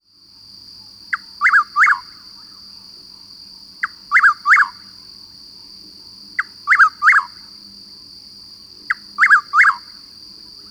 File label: chuck wills widow, Bird Calls; Ornithology